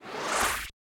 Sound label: Lasereye Gather